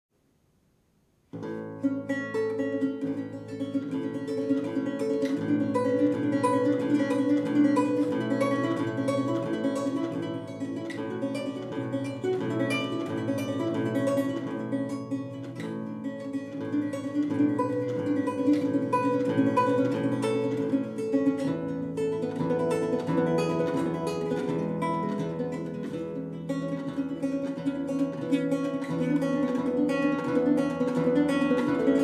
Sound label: Prelude a la maniere du carillon; Suite B-dur